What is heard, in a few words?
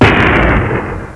bad guy gun; QuickShot Demo; QuickShot v1.0